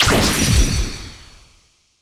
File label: Command Card Played